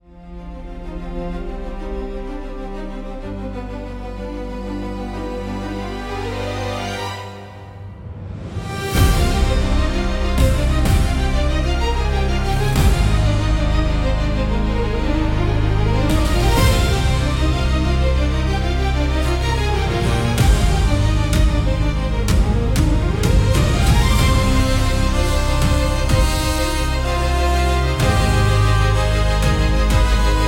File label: Epic Fantasy, cinematic soundtrack; Tuneify; cinematic soundtrack pack